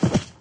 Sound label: body fall; There; ThereClient